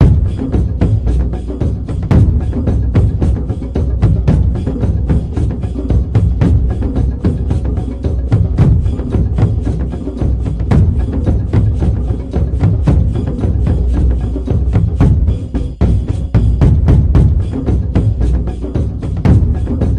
combat drums

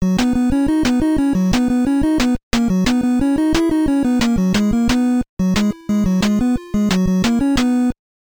Stage Start & Complete Yankee Doodle; North & South; NES

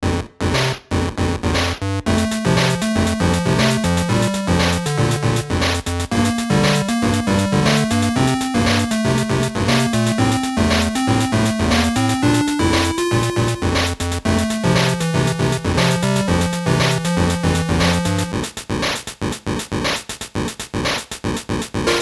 Steel Drum Rhumba; gb

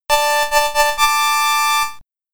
New Record (SID Stereo); California Games; commodore 64

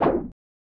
SFX Swipe

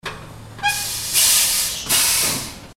steam machine

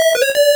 Room Enter, Exit; Shinnyuushain Tooru Kun SG-1000

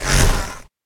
Enemy Boss Attack Babies Flying; Smilinguido

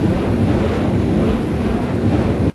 Hero Tank Scorching Loop

